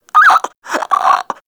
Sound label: Infested Crawler Breath; Warframe